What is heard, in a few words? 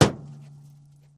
Hammer Hit Auto Body; hammers